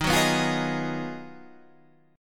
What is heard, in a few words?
Ab Minor 13th, Eb, guitar chord